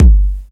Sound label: kick one shot g key